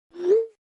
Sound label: iphone send recieve